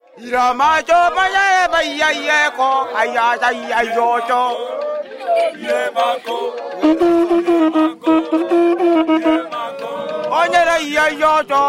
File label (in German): Pygmäen